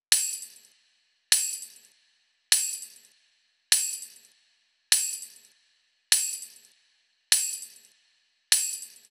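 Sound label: 100 tambourine loop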